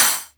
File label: Jomox Cymbal; techno drum fx; Analogue DrumHits